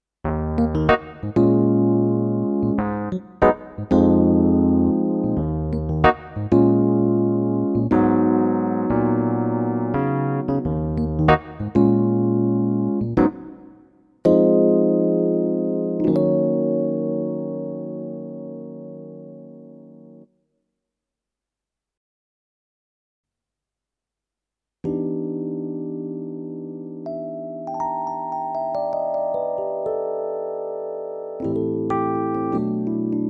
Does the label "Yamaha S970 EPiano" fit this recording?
Yes